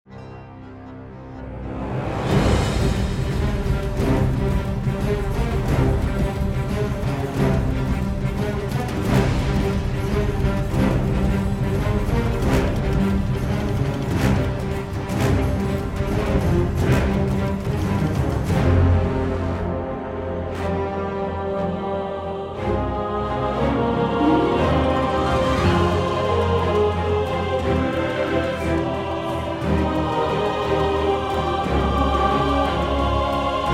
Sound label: music; valve dota 001; ui startup